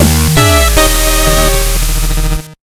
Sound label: Music Boss Intro; Shovel Knight King of Cards